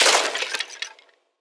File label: Skeleton Death